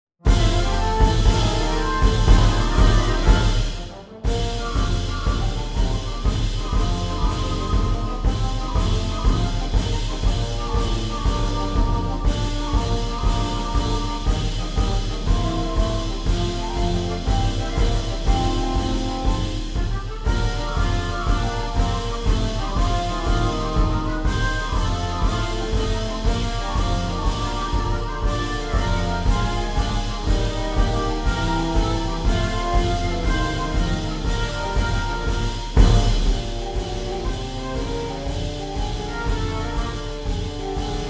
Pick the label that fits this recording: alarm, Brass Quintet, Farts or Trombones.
Trombones